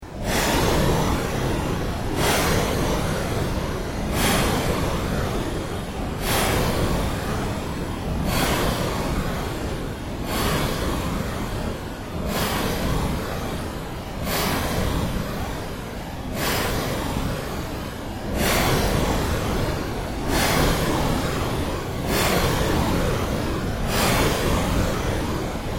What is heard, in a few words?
Ambient Drone Fast Sweep; Sound effects created by manipulating other sounds; Scary Soundscapes